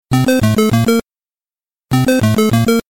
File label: loud; mario